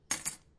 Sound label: key drop